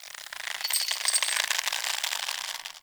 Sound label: Under City Chain Rope Basket Creak; Ambience; Dungeons; One Offs